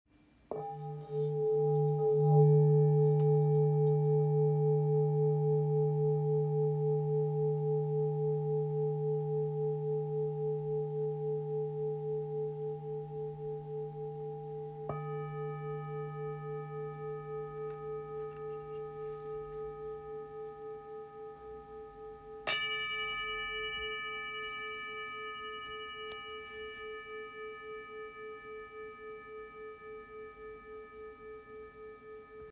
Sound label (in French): Bol re 1.315kg; FM Capricorne; Bol Chantant Full Moon